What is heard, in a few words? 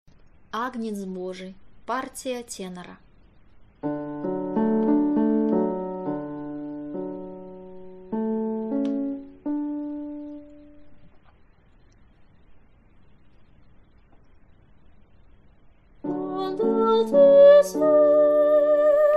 tenor; Agnec Bozhij